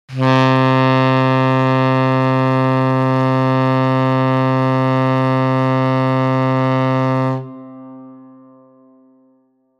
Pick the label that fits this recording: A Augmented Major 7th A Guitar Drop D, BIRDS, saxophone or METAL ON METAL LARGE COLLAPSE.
saxophone